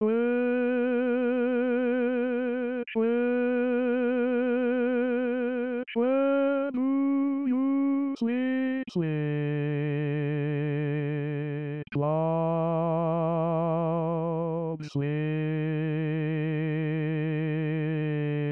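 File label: baritone, barbershop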